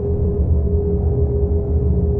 boss crystal

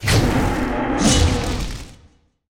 Burning Lava Golem attack

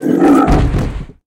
death; Butcher

